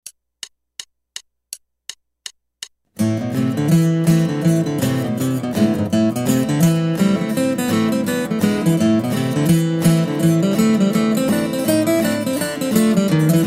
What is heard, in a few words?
Guitar